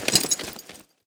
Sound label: suit, footsteps